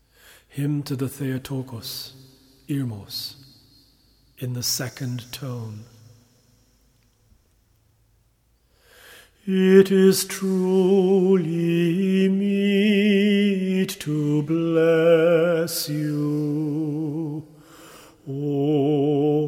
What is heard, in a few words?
Hymn Theotokos Tone2, Serbian chant